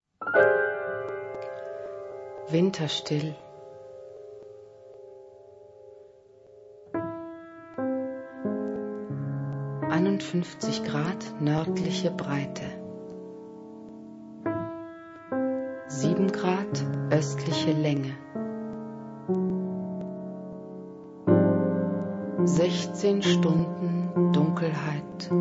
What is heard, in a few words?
Xmas choir; Winterstill